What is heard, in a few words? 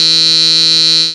buzz; heisser draht